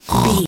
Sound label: voice fun character vocal crazy snore